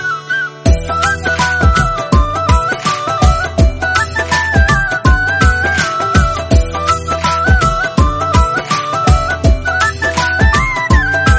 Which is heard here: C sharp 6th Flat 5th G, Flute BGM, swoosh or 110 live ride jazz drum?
Flute BGM